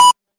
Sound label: notification; UI & Menu